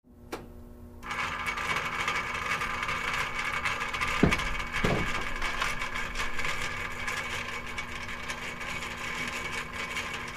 Hot Water Furnace; On With Timer And Furnace Wind Up, Steady Hum With Close Up Pipe Creaks, Off